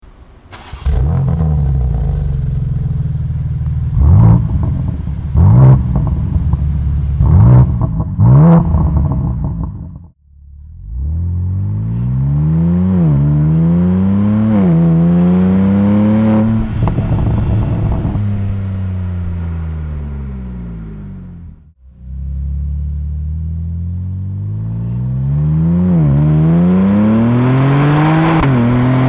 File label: sport exhaust BMW M2; REMUS